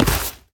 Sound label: wall stone; Golden Crayon Sounds